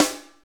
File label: SNARE